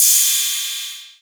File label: Cymbals Ride; Vengeance Samples